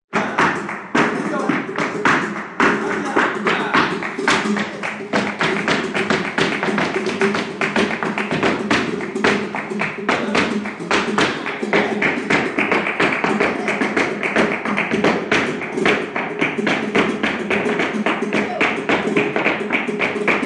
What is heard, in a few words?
flamenco Seville